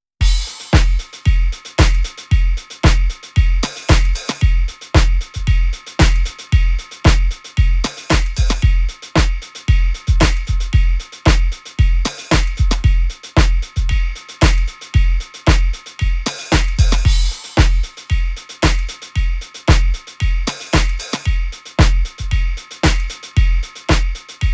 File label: No Drum Tail Reverb